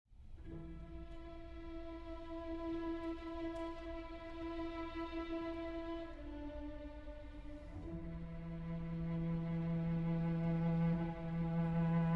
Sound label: Cello Concerto Andante